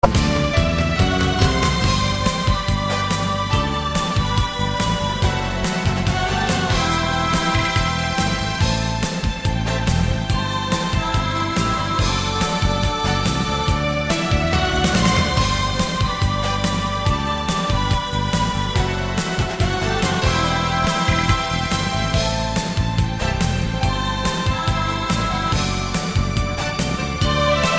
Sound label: Karaoke